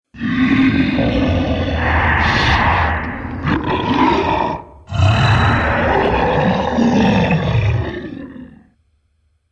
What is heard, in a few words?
Dinosaur Horde; animal; Dinosaur Sound Effects